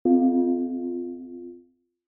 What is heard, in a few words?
tone